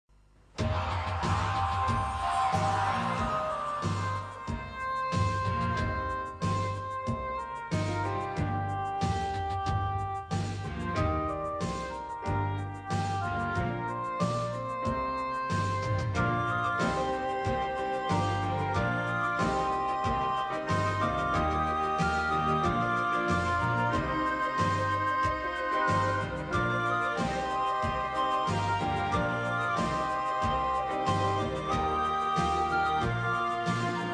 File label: instrumental